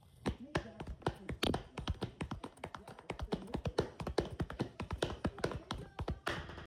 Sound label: Shoes for Horse Gallop